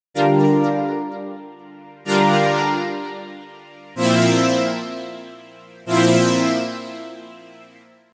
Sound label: Keys Futuristic